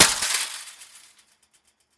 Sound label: Chain Link Fence Gate Drop on Ground; Fences